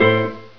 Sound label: strum; bot; cooked